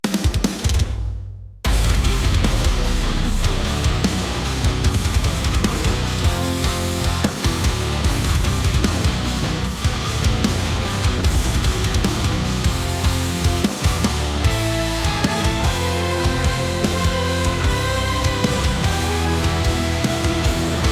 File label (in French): batterie nu metal